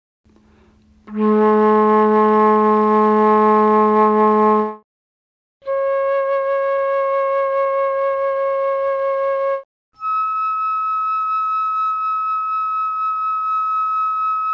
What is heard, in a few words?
fluteb, mellotron